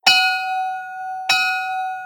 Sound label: old bell